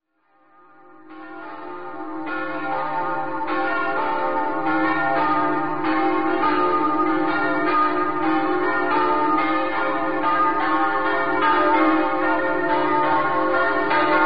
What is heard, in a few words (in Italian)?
fanna, campane